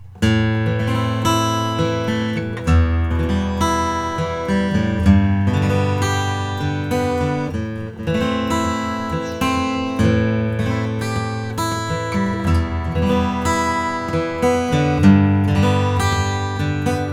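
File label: Taylor style 1 sinker redwood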